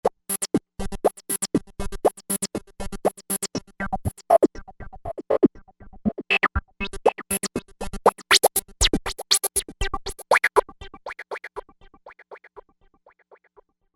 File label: Bounce Daddy